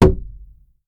peg leg; Garry's Mod; tf2

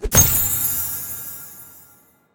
xp chime

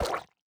ink sac; the monster plane v2; kolmods skill

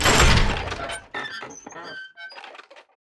comp banner down

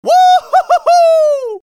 scout triple jump; vo

